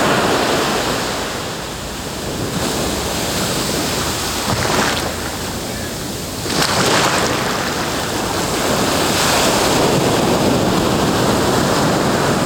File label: ocean wave